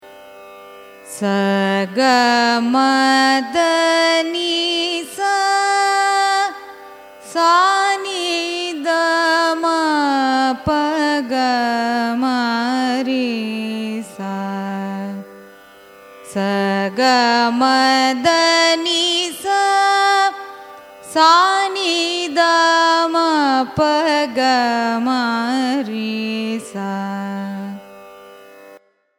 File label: raga bageshri arohanam avarohanam; carnatic music